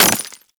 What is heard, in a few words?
Melee Impact Glass; Warframe; Weapons